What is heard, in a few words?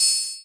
Syn Dr, Overloaded Synthetic Drums; style kits